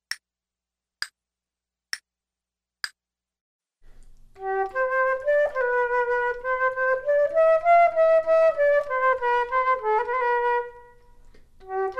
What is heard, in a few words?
Duets flute; Selected Duets for Flute Podcast